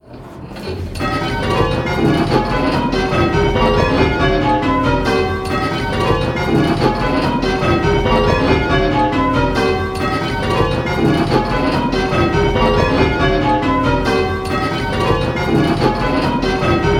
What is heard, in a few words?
Striking 10-Bell Call Changes, Whittingtons; Pebworth